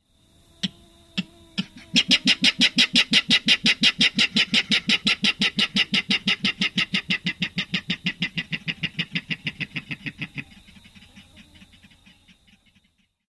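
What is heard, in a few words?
grunting outburst, Clapper Rail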